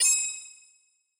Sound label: Duel Time Count Zoom; Yu-Gi-Oh! Master Duel